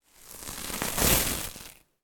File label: horror cobwebs sweep away by hand